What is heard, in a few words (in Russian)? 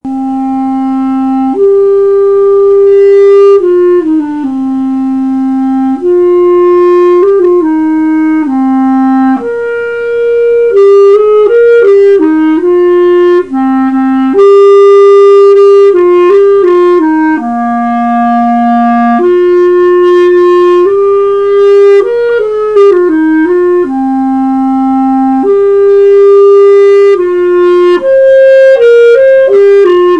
KLARINET